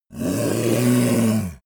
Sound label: yeti growl aggressive; kreaturen